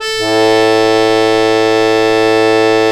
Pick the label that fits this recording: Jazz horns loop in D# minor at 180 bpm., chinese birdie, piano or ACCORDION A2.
ACCORDION A2